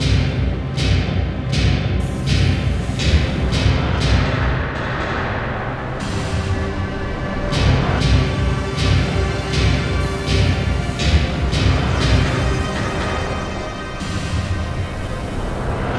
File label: Danger Tonal; Insanely Twisted Shadow Planet